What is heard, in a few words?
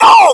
pain; svencoop; scshogo; fallentrooper